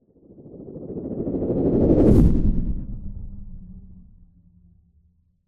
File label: science fiction spacecraft fly by fast